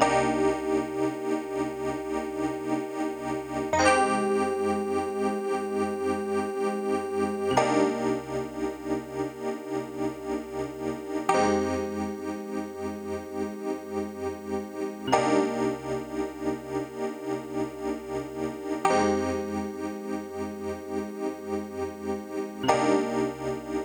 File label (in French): synthe full